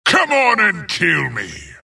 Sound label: death long; dota; skeleton king